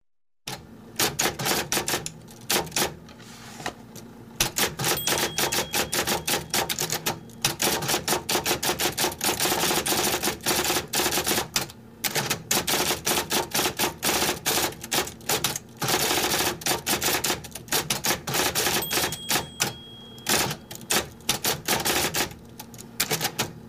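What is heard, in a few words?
electric typewriter fast typing speed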